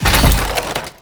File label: Motorcycle, Collision Fast; Warframe; Vehicles; Motorcycle Ninety Nine